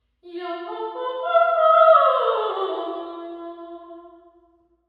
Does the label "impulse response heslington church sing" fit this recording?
Yes